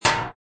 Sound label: shield hit